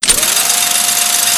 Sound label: machinegun wind up; tf2classic